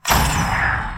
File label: Ice Breaker